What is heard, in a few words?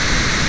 JET